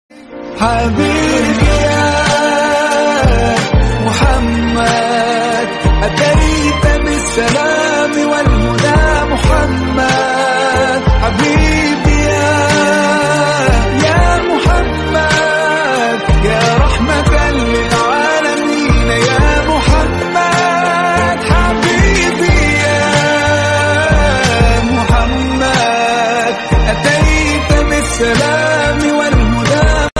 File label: islamic ringtone beautiful naat ringtone